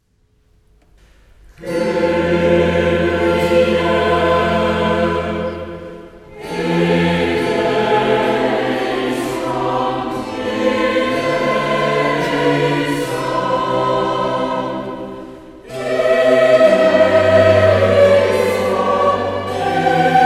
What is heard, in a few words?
Missa in F Kyrie